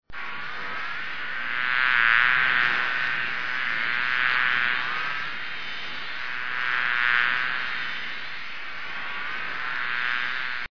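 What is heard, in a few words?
swarm of bees; swam of bees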